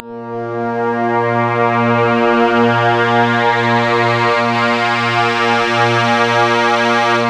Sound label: A#, Synclav FM; Big Fish Audio; SPACE2